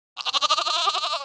Sheep idle

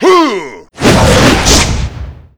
windy giant skill; Seer; GamigoNA

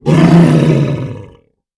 Dark Fog Damage; Seer; GamigoNA

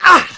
Novice Damage; Seer; GamigoNA

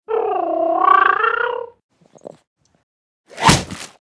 Leopard Action; Seer; GamigoNA

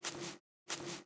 Otter Back

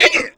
Yeti Damage

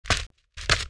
walk; Seer; GamigoNA

